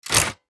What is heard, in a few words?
Casino slot machine reel start